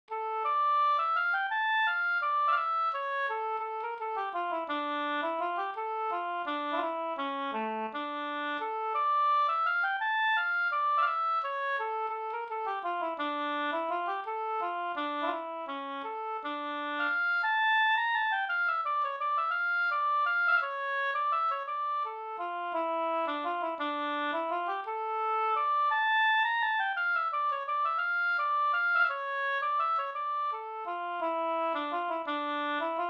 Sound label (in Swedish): vals i gammal stil